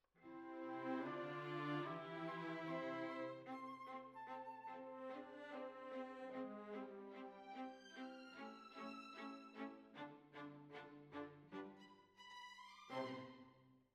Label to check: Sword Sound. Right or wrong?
Wrong